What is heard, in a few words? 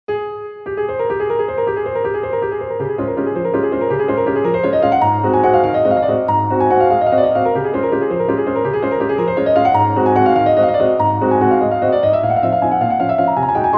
waltz concert; chopin